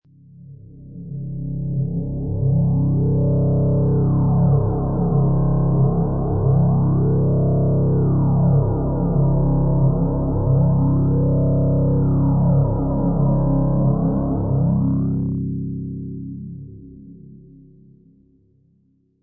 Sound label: Scifi; Scifi computer interface musical tone; Sound Design; Michael Huang